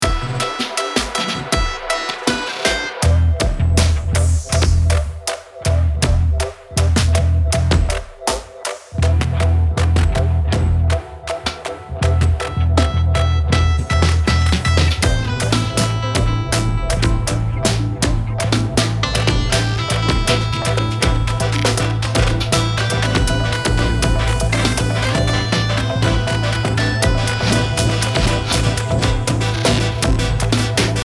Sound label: clean Jazz glitch